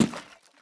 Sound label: junk, player steps; quake2